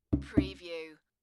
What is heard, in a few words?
Tapping on the Bottom of a Plastic Bucket; beanstalk audio